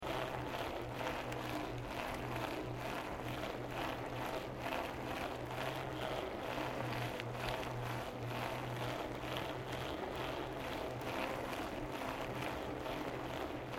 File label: Bicycle Mountain Bike Road Peddelling Loop; Bike near Tazawako Japan; Bicycles; Vehicles; SoundEffects-Foundation1